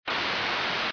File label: noice